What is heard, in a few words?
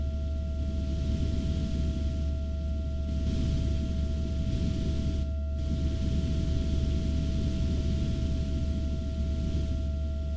vent